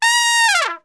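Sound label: A#2 TRP SHF, Trumpet; Best Service; Brass Super Section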